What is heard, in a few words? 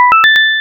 coin arpeggio extended